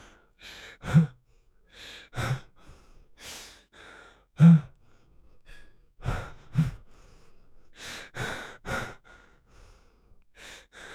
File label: young man having difficul